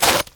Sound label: Imp Claws Tear, Imp Claws tear Flesh; Foley